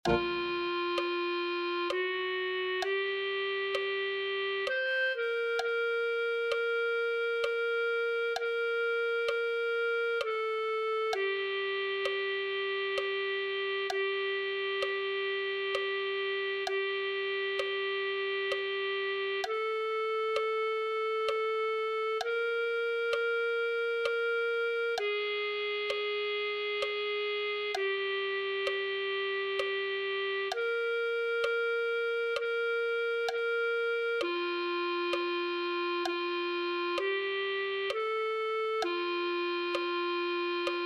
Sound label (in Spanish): clarinete